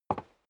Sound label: step sneaker wood creak single right; schritte